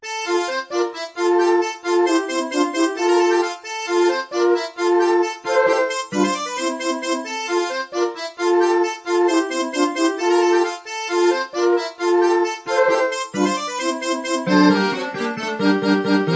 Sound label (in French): instrumental; Gateux mon petit vieux; d-apres l-allegro a deux mains